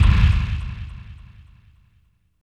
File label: END, Tekno Industrial; East West